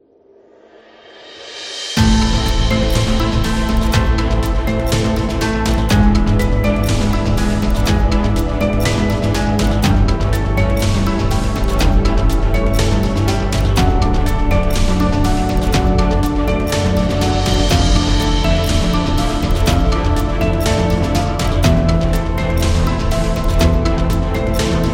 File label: Dramatic Quiz Timer; movie trailer